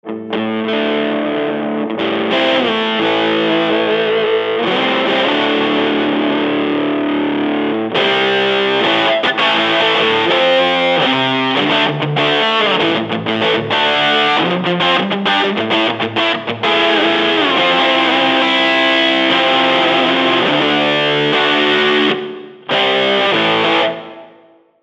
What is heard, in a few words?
amp deluxe OD red